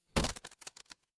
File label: impact crate break